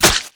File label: Knife Skin, Damage